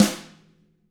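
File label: TAMA snare, drums; ILIO; Double Platinum Drums 1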